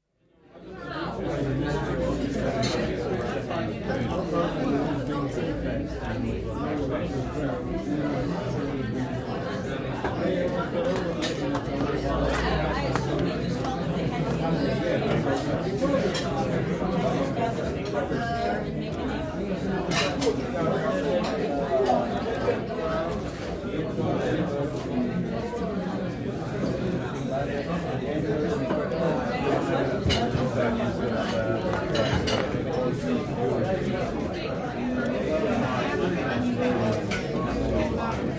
Busy Restaurant, international chatter, crockery, cutlery and waiters' passing footsteps; Restaurant Sound Effects; BBC